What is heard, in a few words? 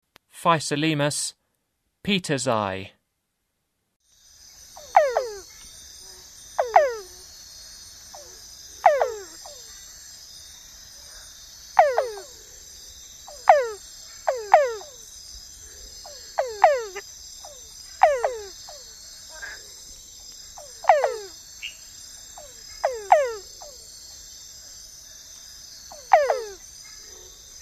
Physalaemus petersi, Frogs of Ecuadorian Amazon; S. America